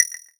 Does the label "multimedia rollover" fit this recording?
Yes